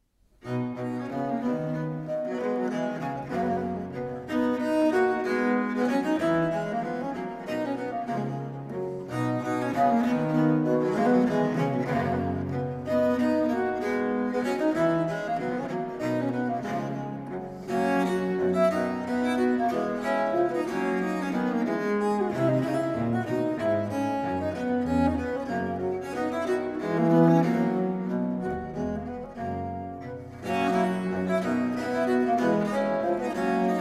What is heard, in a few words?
duo for two bass viols, in c major minor